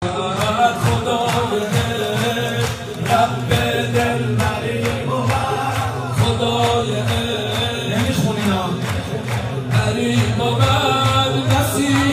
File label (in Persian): مولودی